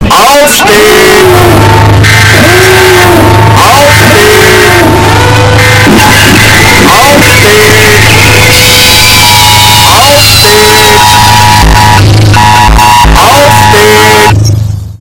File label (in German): entspannter Wecker